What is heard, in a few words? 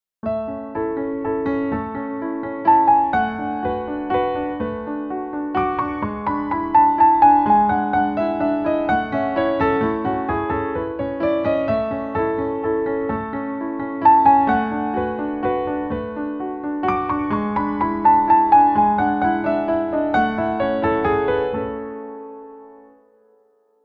Waltz